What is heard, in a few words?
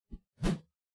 melee attack swoosh heavy, weapon swooshes; psfx